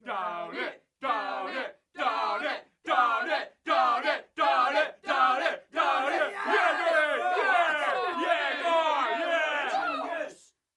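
crowd loop british group lads banter down it, screaming; Sonniss GDC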